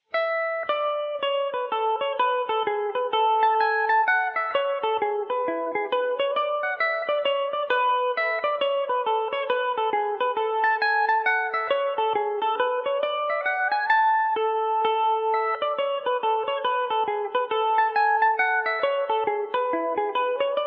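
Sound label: gillespies hornpipe A major revelation, mandolin; Gillespie’s Hornpipe (4/4); Revelation Jaguar electric mandolin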